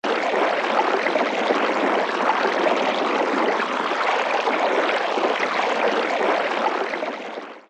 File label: Stream